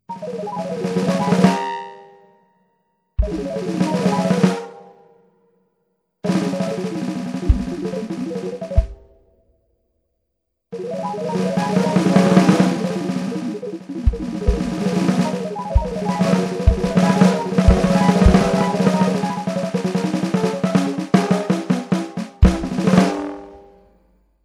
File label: serializer ddrum4